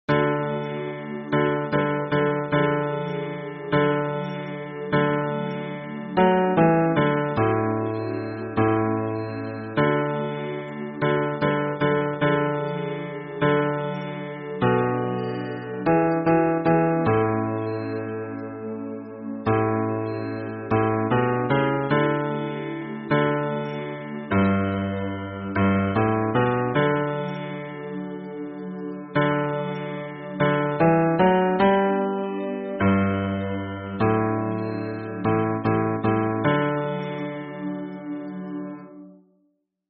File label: hymn bass